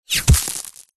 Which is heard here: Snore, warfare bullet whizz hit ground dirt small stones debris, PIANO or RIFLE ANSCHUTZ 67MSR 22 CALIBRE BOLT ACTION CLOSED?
warfare bullet whizz hit ground dirt small stones debris